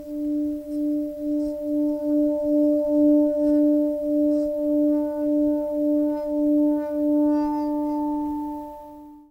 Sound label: Singing Bowl; Поющая чаша